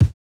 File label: kick, house